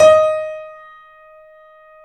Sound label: D#, 3D Pianos, Boesendorfer; E-MU Producer Series Vol. 5; 3-D Audio Collection